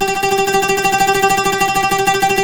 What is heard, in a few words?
TREMOLO, Turkish Oud